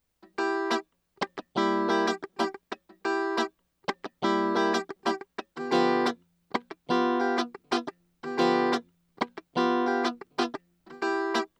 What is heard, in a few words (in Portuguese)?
Guitarra chicabana